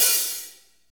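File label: hi-hat, fast rock, kit dry, Roland; Northstar; Drumscapes Roland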